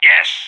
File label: whack popup; moustachio